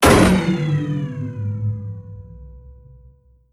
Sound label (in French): moteur shutdown bruitage